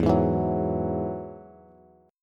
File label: E Major Add 9th, Bass; strummed; JGuitar